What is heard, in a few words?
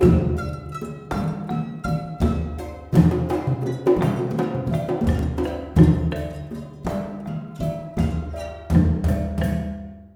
MALAY PHR L, Heart of Asia